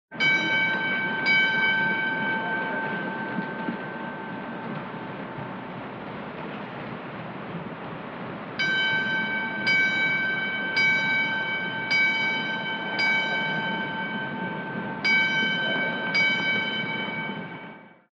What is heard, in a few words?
Ship Bell 9 Rings Engn; bells